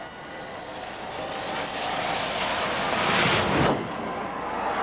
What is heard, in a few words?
takeoff; EAU CLAIRE HTS; THOMAS NAUNAS